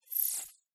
UI HOLOGRAM CLOSE; PMSFX

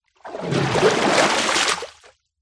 nature water deep movement surface

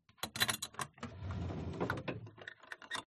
Drawer Heavy Dresser With Metal Handles Open; uberduo

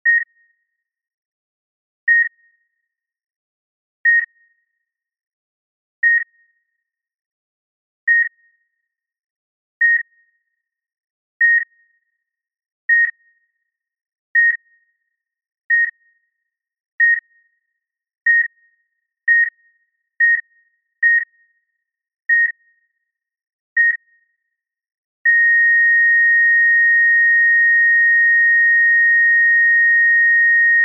medical heart rate monitor irregular flatline

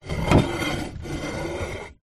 household ceramic fruit bowl movement on table

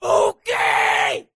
ok scream

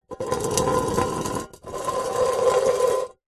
tin metal cylinder lid on scrape on concrete; foley; zapsplat

